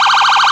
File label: pier, sirens; whelen gamma